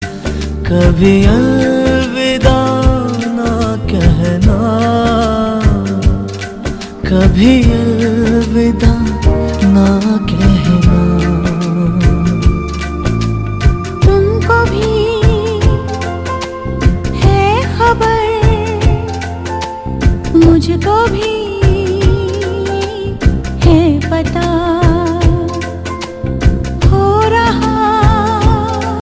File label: male